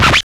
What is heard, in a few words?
SCR, SCRATCHES; Drums In Your FACE!